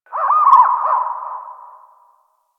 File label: Bird Distant; Ambience; One Offs; Warframe; Eidolon Plains